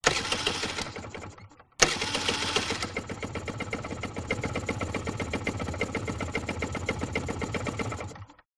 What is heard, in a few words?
PIAGGIO ZIP 49cc Onboard False Start Start Up Idle Shutdown Engine